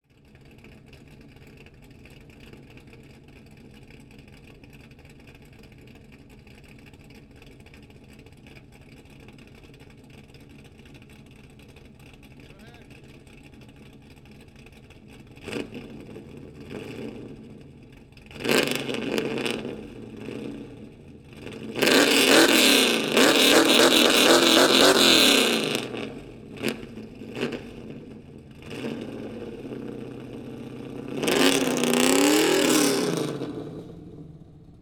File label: Camaro Straight pipes